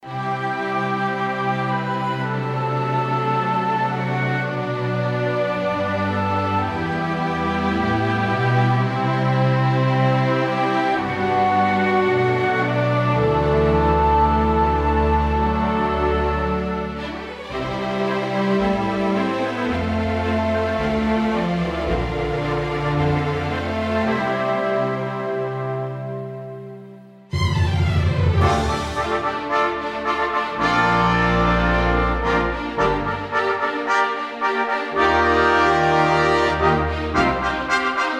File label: Matrix of Triads